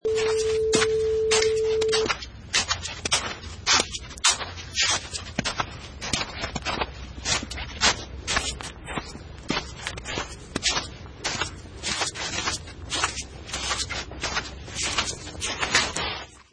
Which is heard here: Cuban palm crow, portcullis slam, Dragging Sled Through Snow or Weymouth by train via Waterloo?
Dragging Sled Through Snow